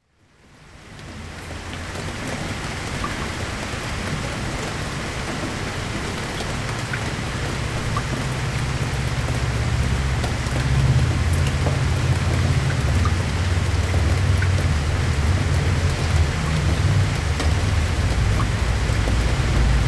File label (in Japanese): ame no oto engawa; Chino Cultural Complex